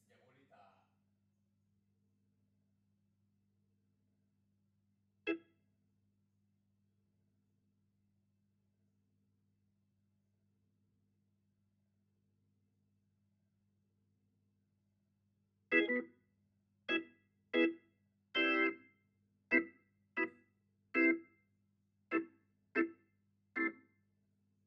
Hammond

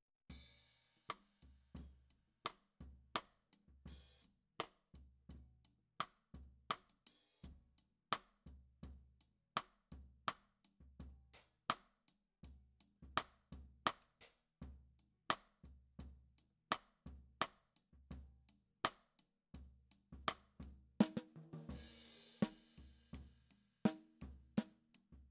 Snare Top

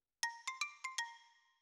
Xylo Close

